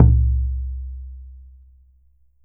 POOK, KOREAN DRUMS; AKAI S6000 CD-ROM; WorldSounds

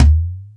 House Machine, Drum Machines

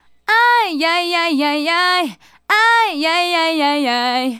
Female Solo, Hip-Hop and RnB Vocals

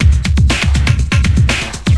thumpin, Breaks

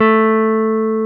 Jazz Guitar; 90's Sample CDs; Club-50 - Foundations Roland; Jaz-Kessel

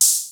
Hi Hat, Cwejman S1 MK2

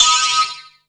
clanger; BERZARRESOUNDZ

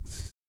ELASTC TUBA, Vocal Blues Soul; Spectrasonics; Planet

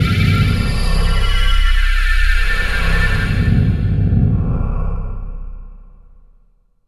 Scary, nightmares; Dream Zone

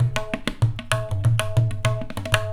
100 TABLA, Indian Traditions; USB Soundscan vol.45; AKAI

